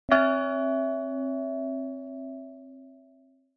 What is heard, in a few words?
alarm